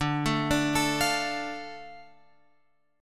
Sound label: D Minor D Guitar Open F; arpeggiated; JGuitar